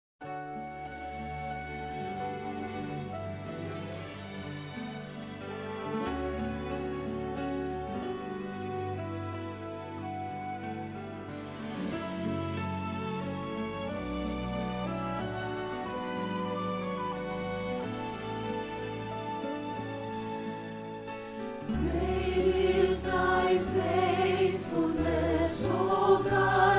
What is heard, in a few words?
Soprano, Great Is Thy Faithfulness, Choir Rehearsal Parts